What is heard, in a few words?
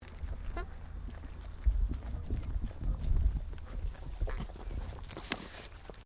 Animal Alpaca Female Eating; Alpacas on a farm in Gippsland Victoria; Miscellaneous Animal